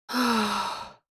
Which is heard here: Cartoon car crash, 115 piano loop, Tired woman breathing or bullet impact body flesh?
Tired woman breathing